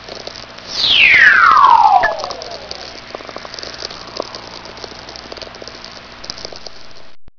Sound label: whistler, Vlf